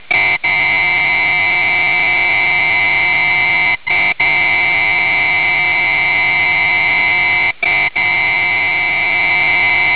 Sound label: gtor